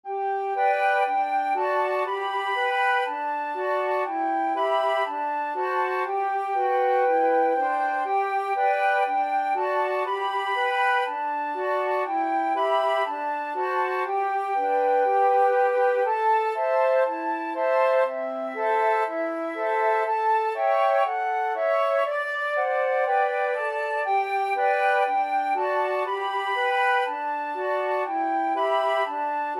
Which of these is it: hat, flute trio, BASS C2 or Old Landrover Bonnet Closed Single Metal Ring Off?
flute trio